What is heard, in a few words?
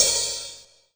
Korg M1 Open Hi Hat